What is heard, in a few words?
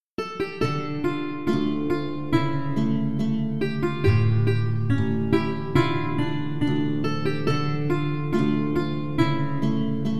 bourree Dm